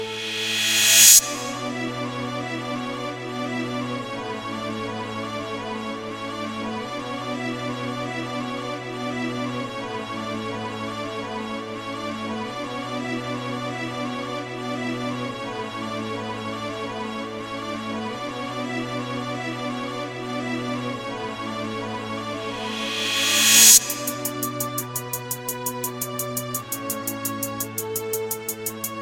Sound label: Sad Violin; meloboom